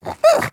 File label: Puppy Idle Coo; Vocals; Warframe; Kubrow